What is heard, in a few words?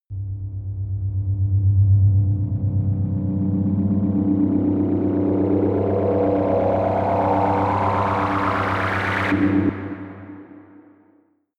rise hum whir deep